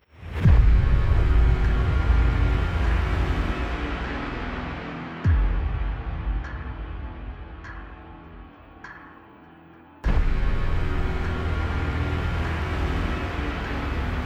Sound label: scary music